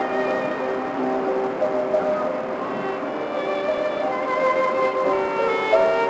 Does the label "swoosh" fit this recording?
No